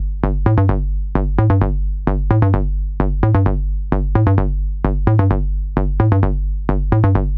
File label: NRG Bass, Trance